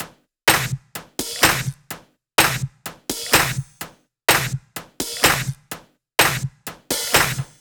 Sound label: 126BPM Cubic Kit; Vengeance Samples